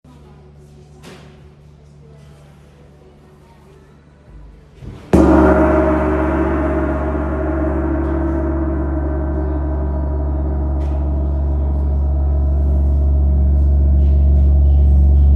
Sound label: Tam Tam